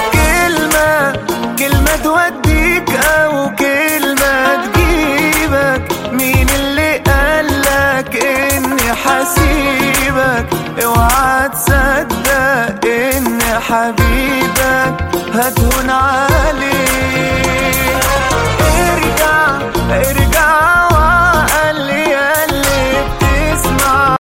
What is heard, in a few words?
arabic song ringtone